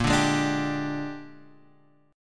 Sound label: Bb 13th Bb down Guitar Drop D; Bb13 strummed; Bb13 chord